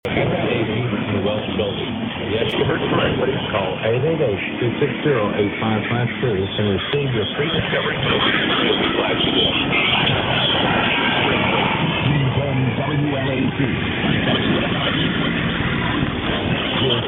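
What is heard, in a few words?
AM radio station, Nashville; 1510; mw dxing; wlac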